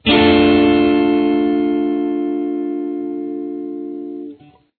A minor alternate, guitar

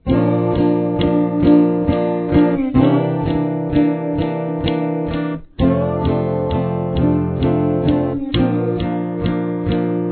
prechorus, guitar

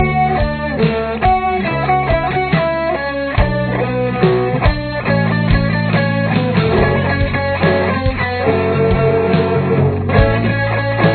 guitar

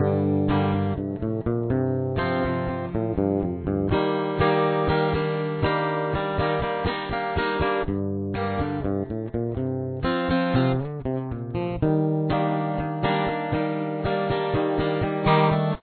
interlude

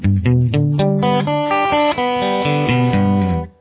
Arpeggios G Open, guitar; CAGED Open Arpeggio Shapes

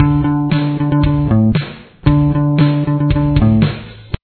bass

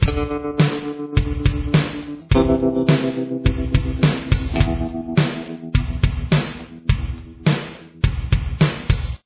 verse part 3 guitar

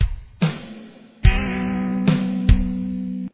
verse guitar

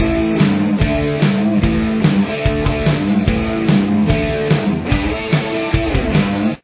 riff 1 guitar

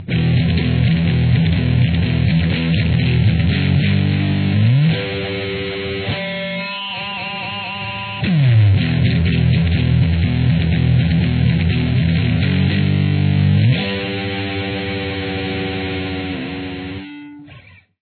guitar

